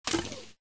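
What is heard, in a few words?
bullet light ballistic titan ricochet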